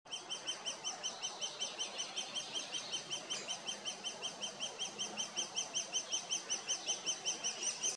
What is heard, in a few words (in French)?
Pic épeiche